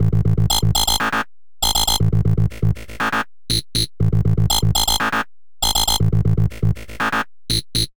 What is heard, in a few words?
Perky, Bass; Sonic Foundry (Sony Creative Software); Underground UK House & Electro